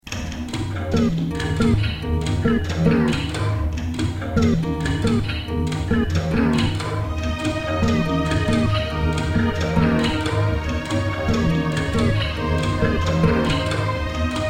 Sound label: Jingle